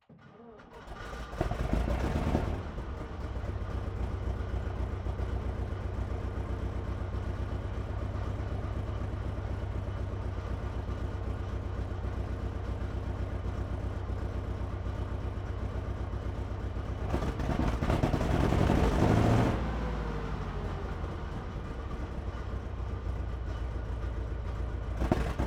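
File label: SOMUA Tank, Exterior, Start, Idle, Blips, Off; S35 1942; DPA4021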